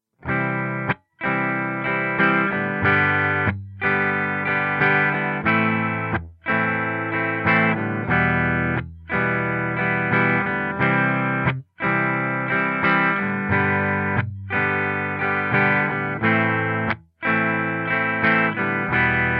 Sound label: rhythm; xmas